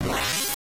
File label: wheesh; Super Smash Land